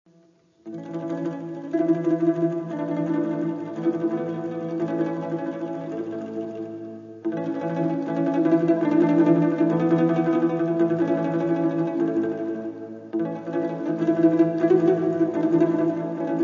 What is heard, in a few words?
hutsul melodies